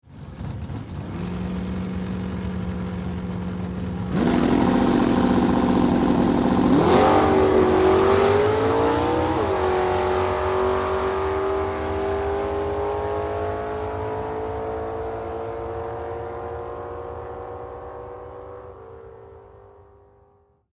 Dragster Away, Sports, Dragster, Drag, Away, Two, Cars; Race Cars; BlastWave